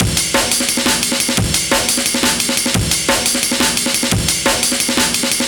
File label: Amen EQ, C, 175, classic dnb breaks